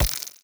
step layer, headcrab black movement

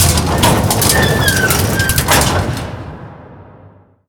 sugarcane lift gate open; doors

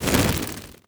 buzz soft, antlion wings

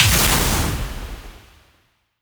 smoke release layer; creatures

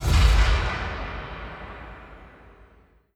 step balcony reverb; creatures; Half Life Alyx

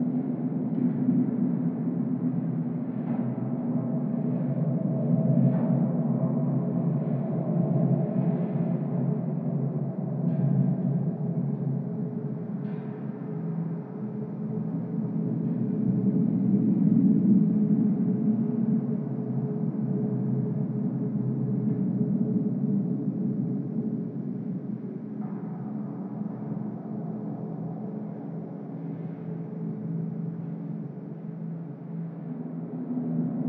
drone interior; aztec2

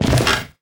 fs fm plywood, footsteps; Portal 2

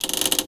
window; ambient; inferno

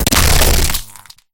electric start, substation; mechanical units; Half Life Alyx

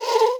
move, hinges drawers; tictac

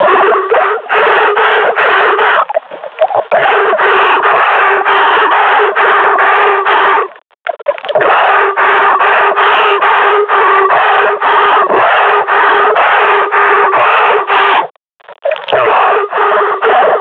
breathing, combine grunt

